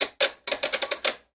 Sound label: Washing Mach Knob Sw; washing machine